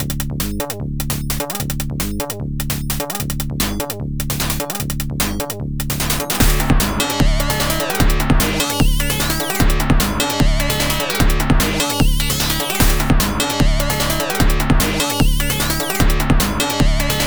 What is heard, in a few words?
Music (FM Acid); Mario 3 Around the World; Genesis